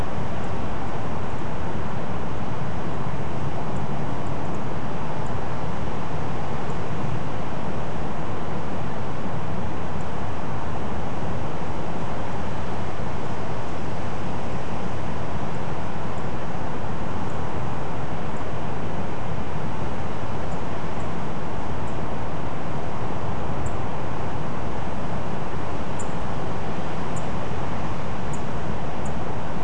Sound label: Yellow throated Bunting calls; nocturnal flight call; Beijing